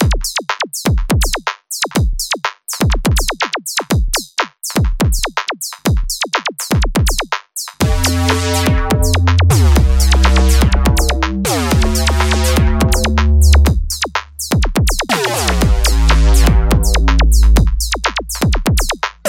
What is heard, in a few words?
microfreak